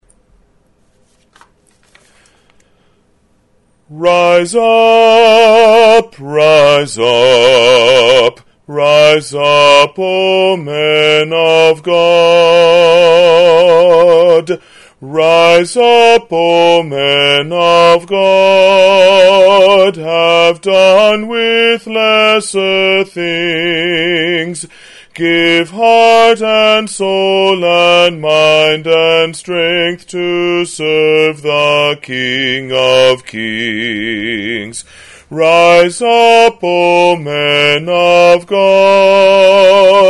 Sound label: - Bass; Rise Up, O Men of God